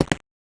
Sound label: Red Button Press